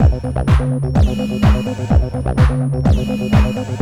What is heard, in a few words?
ACID JAM